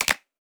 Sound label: clap, hip-hop drum kit; 99Sounds x Monosounds; Moon